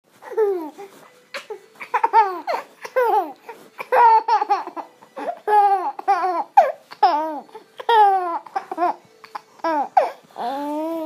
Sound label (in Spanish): Carcajadas